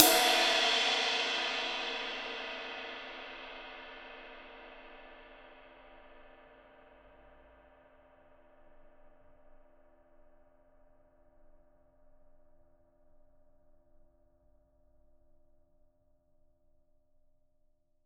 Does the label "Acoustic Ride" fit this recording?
Yes